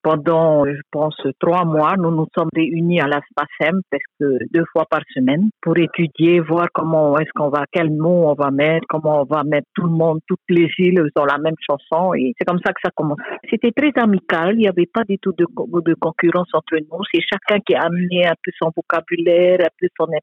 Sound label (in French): HYMNE, l'hymne du pays; ia ora o tahiti nui